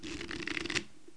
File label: shuffle